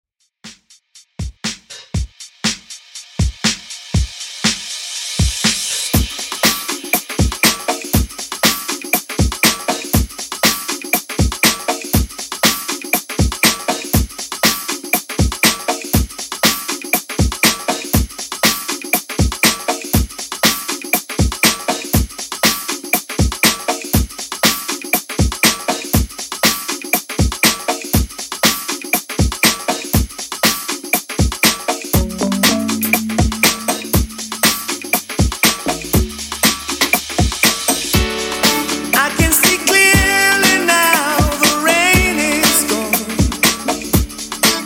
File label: Reggae Redrum 120Bpm